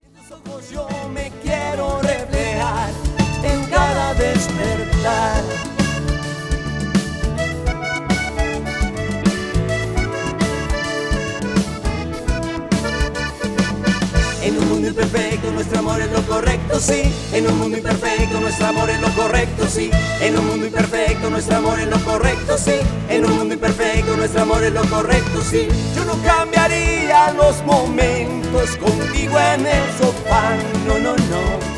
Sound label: Rock Hispanic